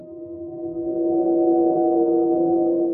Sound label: coin ding; sound effects